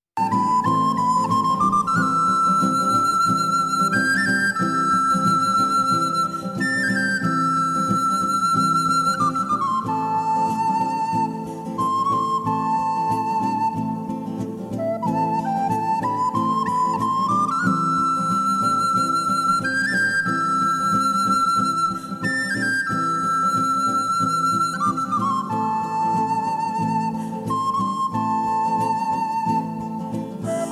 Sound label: El condor pasa (Instrumental)